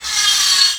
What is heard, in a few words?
saw, circular saw hit; weapons